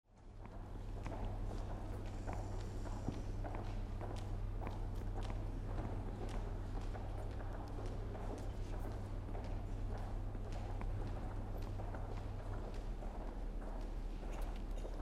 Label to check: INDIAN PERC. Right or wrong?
Wrong